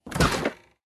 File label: Bear small door Open